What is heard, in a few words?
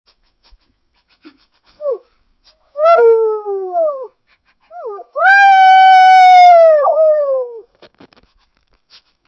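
dog whining extremely sad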